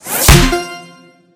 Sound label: multiplier woosh; cashnoire